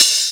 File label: Open Hihats, Cymbals